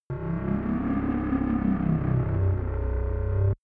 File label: scavenger ship